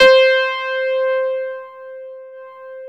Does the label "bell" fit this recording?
No